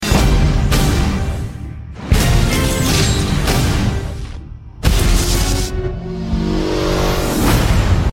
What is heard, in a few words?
Msg Tone; Mi4